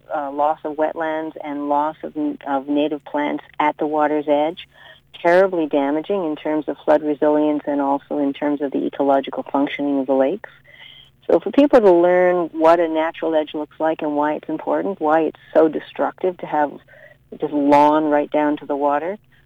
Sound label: SAXE